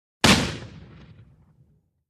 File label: Army Rifle Shot Deep, exterior